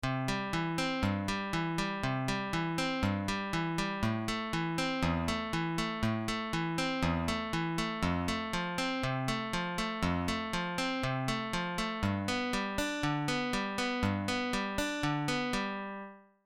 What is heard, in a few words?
Fingerstyle basic exercise